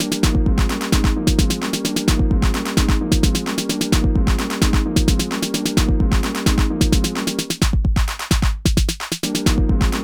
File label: Instrumental; friday night funkin vs. bald gru